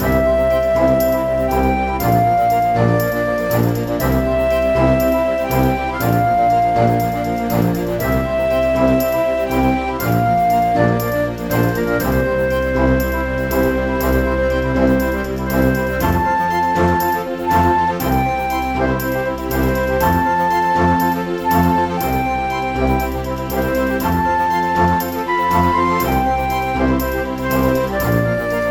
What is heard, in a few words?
Bustling Village, fantasy